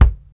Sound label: original kickdrum sample a sharp key; Duration 0:01; Mono; kick drum samples